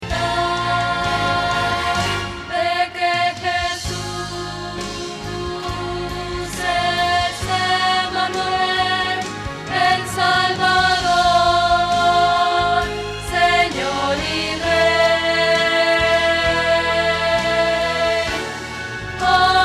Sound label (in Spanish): Contralto